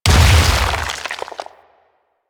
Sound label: Stone Death; Warframe; Brawler